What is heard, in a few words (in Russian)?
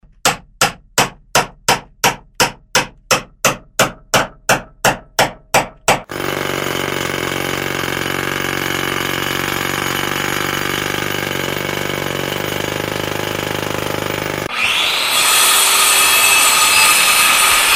shum strojki